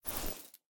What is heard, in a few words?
cloth; smite; daji